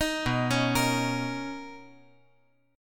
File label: B Major 9th B, chord